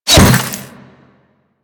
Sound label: Glass Unbreakable Impact; Warframe